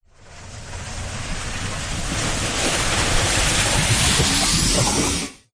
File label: Sci-Fi Power Up; Ryan Van Eerde